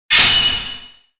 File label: shakiiin